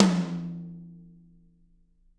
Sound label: TT, Toms; Bob Clearmountain Drums I